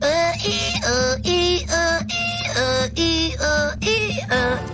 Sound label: ambulance